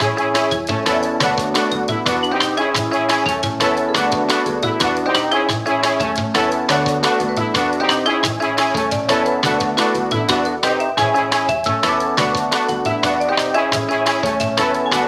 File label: Elevator Music; Pizza Game original soundtrack